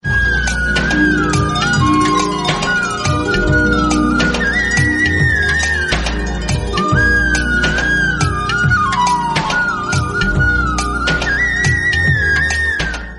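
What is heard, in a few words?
romantic violin ringtone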